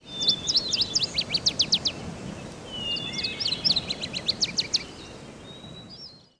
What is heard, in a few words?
Birds